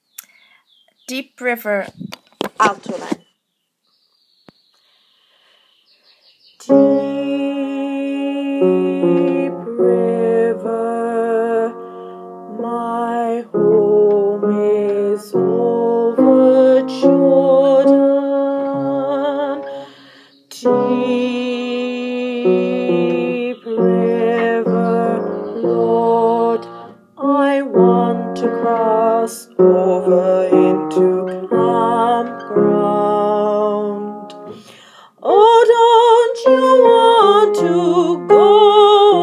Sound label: Alto; Deep River